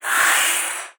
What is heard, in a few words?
Consort Death